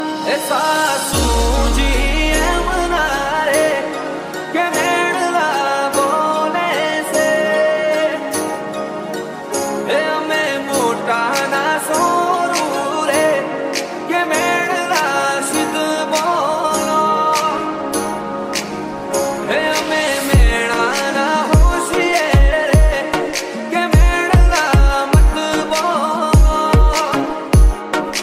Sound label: gujarathi music ringtone